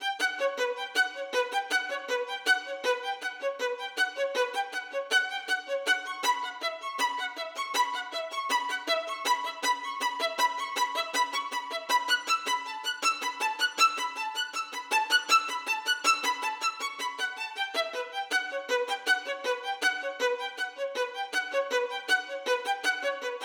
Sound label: additions violin